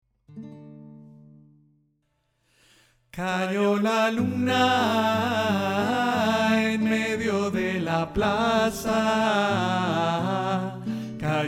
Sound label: baritone